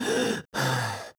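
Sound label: Realistic Fatigue Voice, male; oblivion; Imperial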